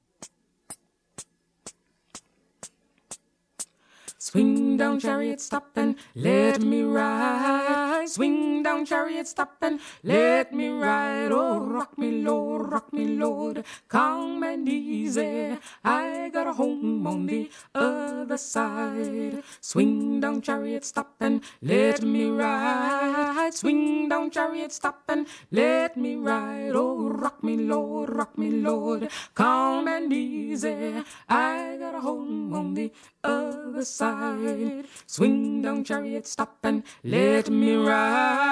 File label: swing down chariot soprano